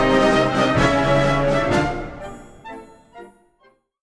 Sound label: Polka